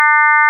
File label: basic Sin